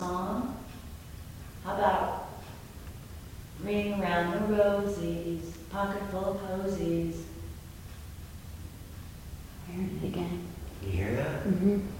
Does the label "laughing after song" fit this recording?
Yes